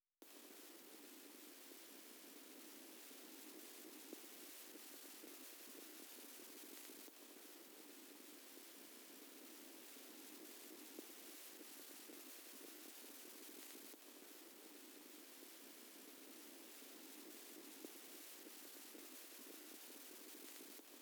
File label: 140 BPM A Min Tape Noise, Drill; Cymatics; Fearless; 2022 Melody Collection